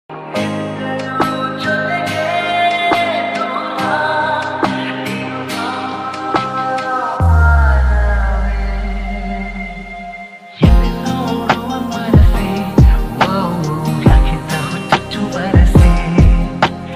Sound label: kannada love song